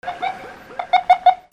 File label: ALARMS